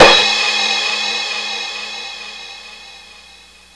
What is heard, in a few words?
cymbal